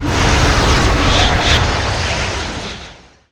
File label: item cyclone; dota